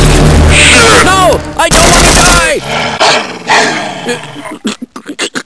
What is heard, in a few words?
Gonome Beam; svencoop; Desolation